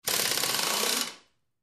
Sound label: Grinding Air Wrench, air tool